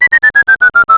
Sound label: asleep